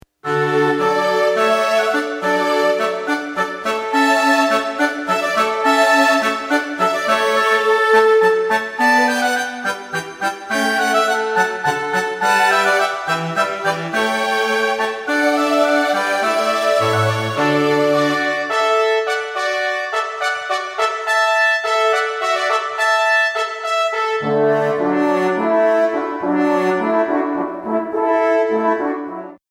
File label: Water Music Hornpipe, ringtones; Handel